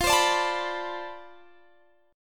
D-Minor 13th, F

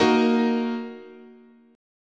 Bb Suspended 4th Sharp 5th, guitar chord

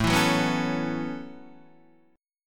A 6th Add 9th A down Guitar DADGAD

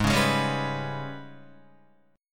Ab Minor Major 7th Double Flat 5th, down, Guitar; AbmM7bb5; strummed

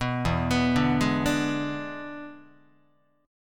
E 7th E down Guitar; arpeggiated; chord; JGuitar

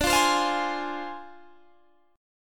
D Minor 7th Flat 5th Guitar Standard; Dm7b5; strummed

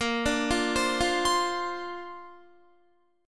Bb Major Add 9th, Bb, down, Guitar; Bbadd9 arpeggiated

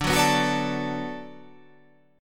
D 6th D down, chord

